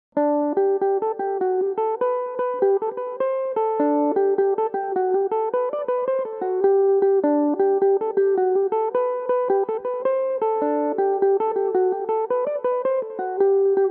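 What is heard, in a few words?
G major, irish mandolin, tunes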